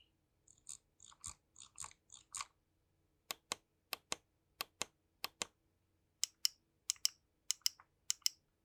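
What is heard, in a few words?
Aerox3 Scroll, mouse; MMDPI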